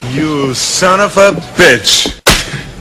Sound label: sob